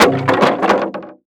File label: Crash Wood Board Dropping On Wood Debris; crashes; AdobeCC